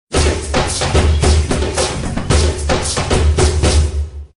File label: win big; Gorilla